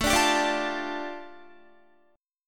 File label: A 7th Suspended 4th Sharp 5th, A, down, Guitar; A7sus4#5 strummed